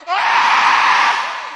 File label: pain; ragemap2023; slasher; slasherghost